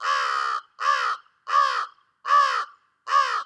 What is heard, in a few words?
crow